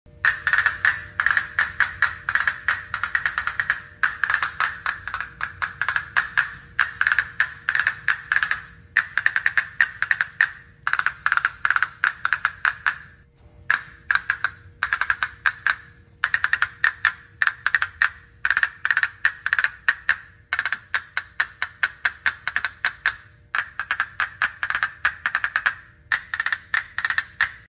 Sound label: Castanets